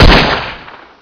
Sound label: shoot, weapons